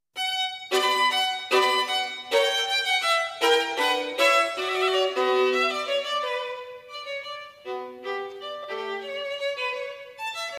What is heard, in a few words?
violin